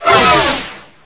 race pain

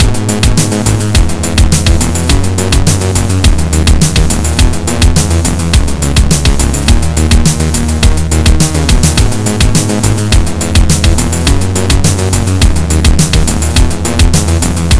chiptune

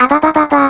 alert; votemap

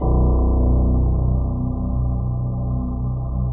suspence, music

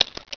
wpn deny select

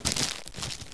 player duct

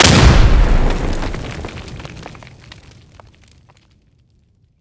det, wpn gren

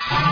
die; sven; npcs; robo snark

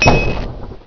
button

